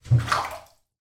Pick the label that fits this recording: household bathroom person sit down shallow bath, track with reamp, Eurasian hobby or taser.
household bathroom person sit down shallow bath